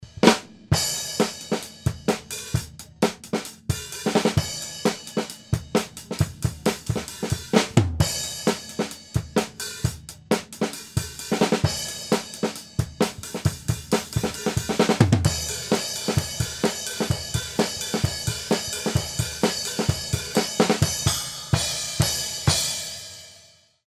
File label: Drums